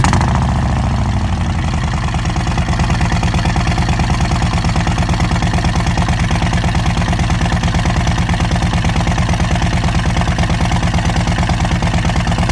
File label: Baffled 51 inch cholos Milwaukee 8